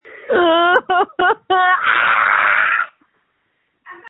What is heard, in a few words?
Scream